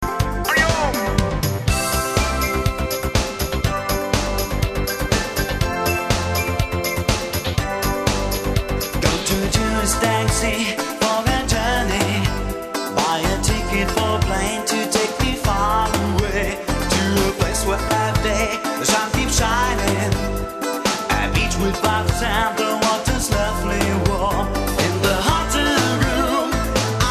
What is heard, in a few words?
Heartbeat